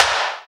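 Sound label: snare drum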